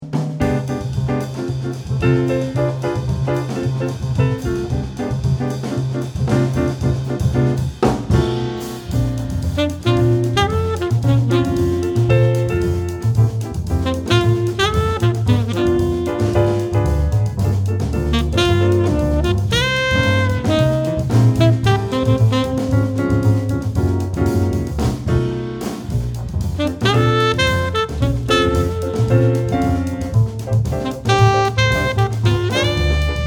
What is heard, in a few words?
Samba